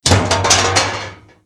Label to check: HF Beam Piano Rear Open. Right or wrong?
Wrong